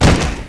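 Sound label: body hit; dactylsp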